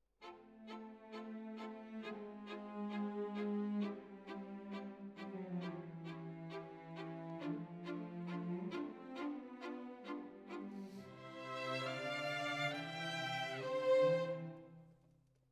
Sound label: Violin Solo